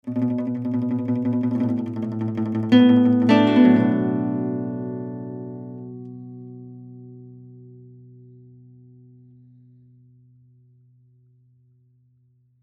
Zhongruan; Phoenix Orchestra

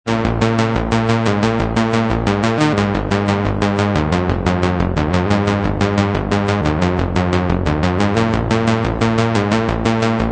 puls mod

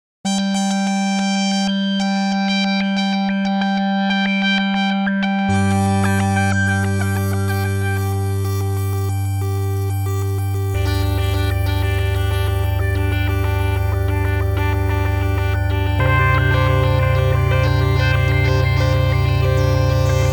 Harmonic DUST; Xils; PolyKB3

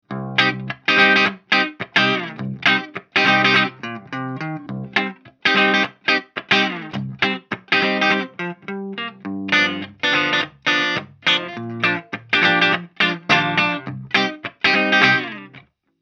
Peavey 6505 Clean

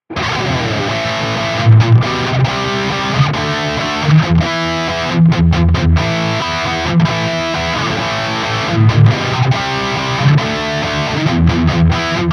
Ibanez QX54QM SLO Highgain Rhythm

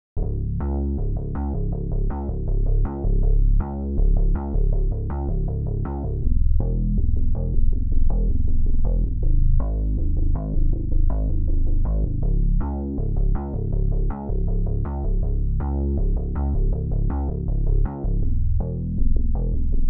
Lowtone Bass Guitar no FX Normal no Lim; LDS; 16dBFS

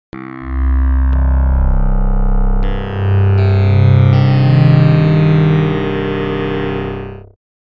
Manhattan Filter

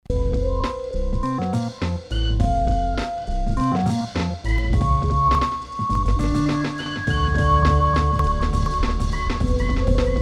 Numark v7 delay

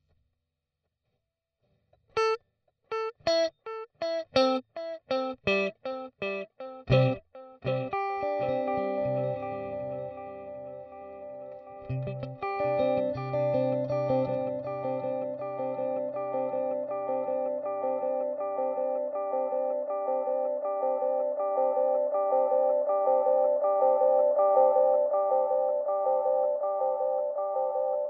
60s Delay

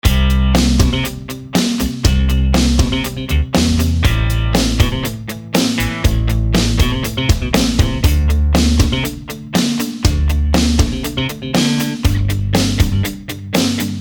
VG2 Muted

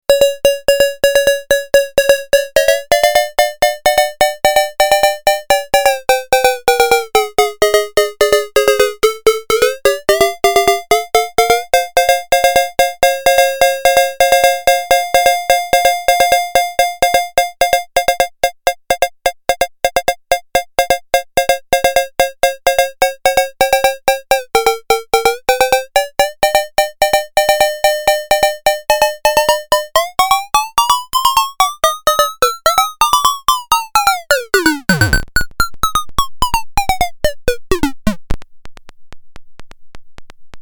twincussion cowbell

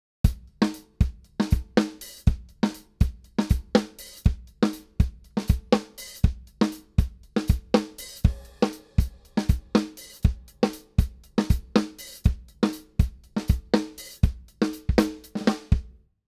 Drums

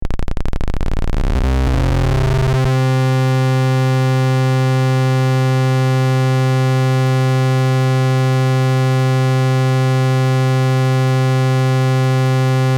Osc1 Level Feedback

